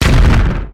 feedback

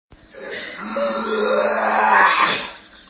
dog gagging

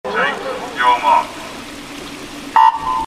take your marks beep

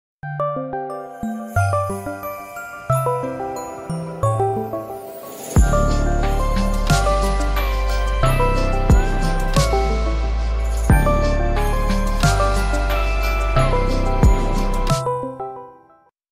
christmas ringtone; discord

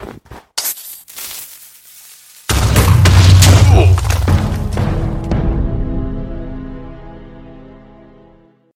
blown up by creeper

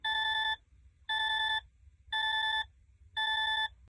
red severe error sound; daf

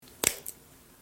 crayon snapping

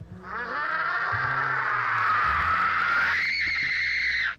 scream; laura palmer meanwhile

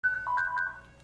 police panic button sound

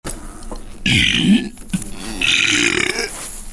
buuurp

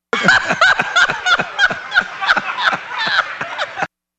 pterodactyl laugh

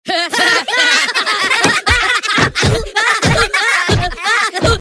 laughing; minions

